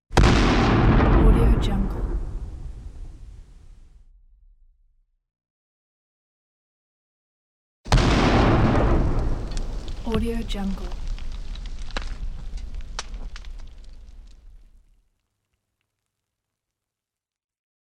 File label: explosion; gungomer